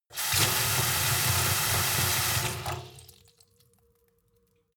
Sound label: sink fill with water hard; industrie-wirtschaft; gastronomie